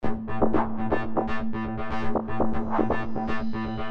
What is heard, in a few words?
instrumental